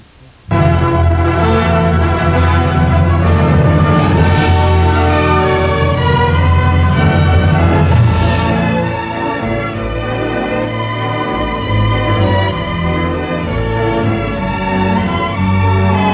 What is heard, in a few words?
Spectro Magic - long version; recordings from Disney theme parks and movies